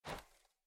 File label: step; snakeinabox; double time pitch shift